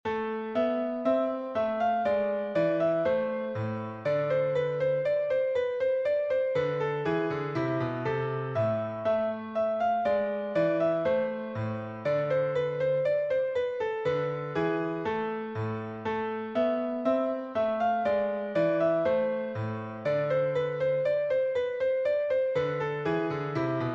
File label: Old French Dance